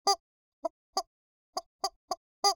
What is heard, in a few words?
chicken clucks; wonder-junction